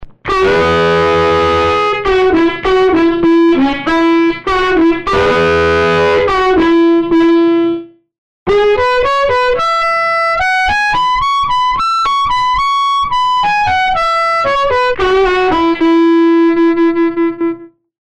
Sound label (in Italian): ARMONICA AMPLI FILTRO 3000